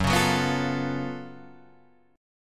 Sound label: Gb Minor Major 11th Gb down Guitar Open G